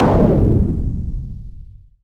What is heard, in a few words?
hit, IMPACTS & HITS; Techno Trance Essentials